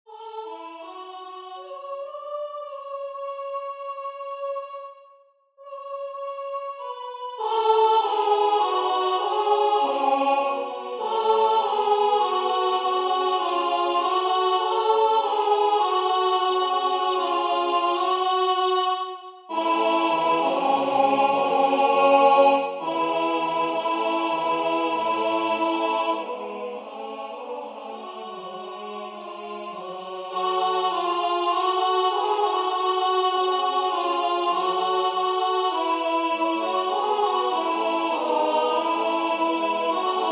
alto; Ave Maria; Victoria

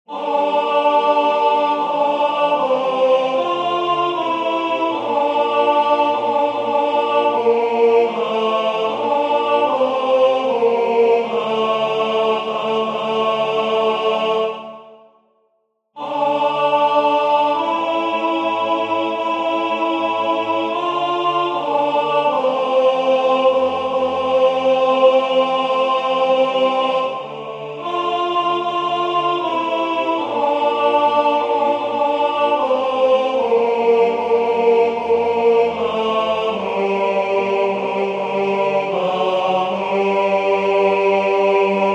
Jesu Rex admirabilis tenore; Palestrina

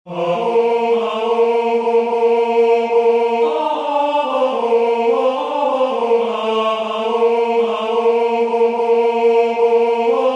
Venez divin Messie tenor

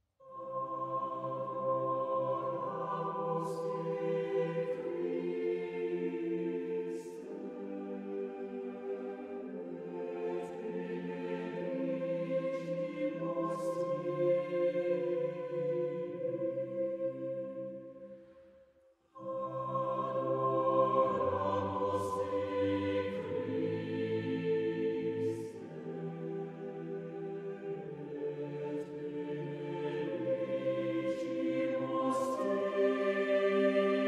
Adoramus te Christe Chor; Dubois Helbling

